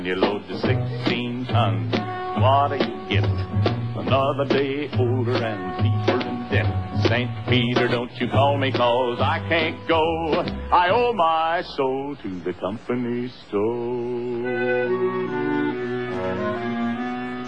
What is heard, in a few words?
Chorus